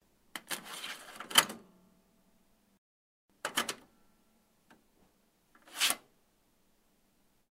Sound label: floppy disk insert and eject